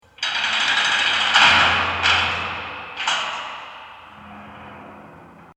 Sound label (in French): prison porte